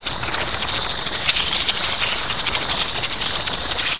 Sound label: insects